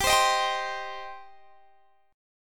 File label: D sharp 6th, G